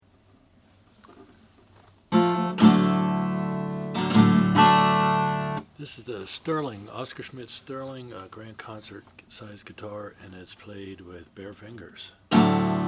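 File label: oscar schmidt sterling natural, Guitars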